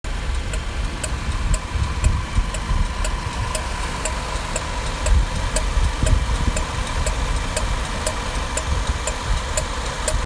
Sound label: Range Rover ticking sound